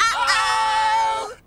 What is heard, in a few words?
"uh oh" kids cheer, Voice; ooh ahh; uh um